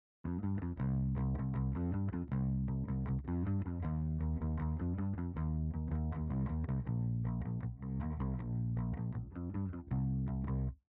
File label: BASS REV